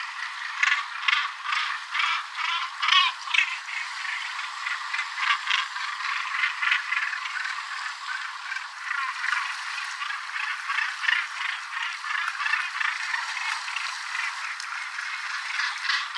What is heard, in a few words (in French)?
Fou de Bassan Morus bassanus